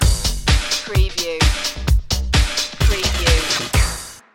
saw twist sting

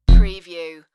Bass Doing

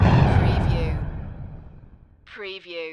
Explosion Spacey; Action, Impacts & Explosions

